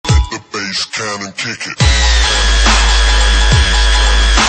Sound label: dubestep bird